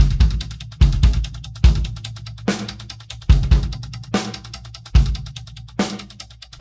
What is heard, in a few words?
drum loop 4 bar 4-4, 145